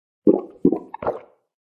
drink

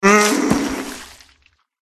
zombie death

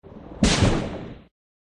Dark Spirit

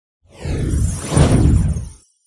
cat attack voice middle

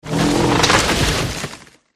tree death

